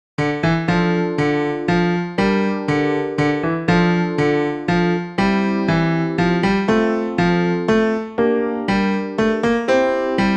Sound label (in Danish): tenor